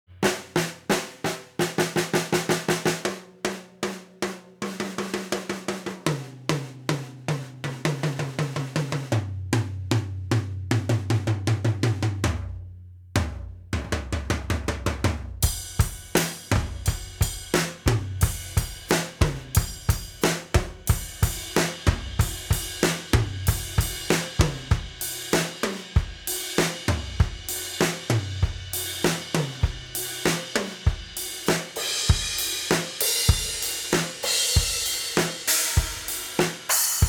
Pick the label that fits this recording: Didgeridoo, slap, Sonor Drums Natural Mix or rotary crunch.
Sonor Drums Natural Mix